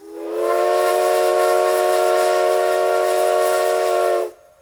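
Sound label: Ambient locomotive whistle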